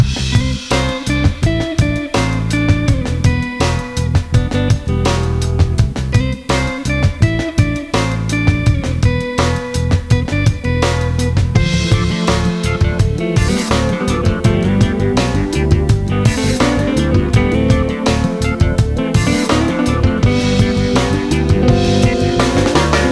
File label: funk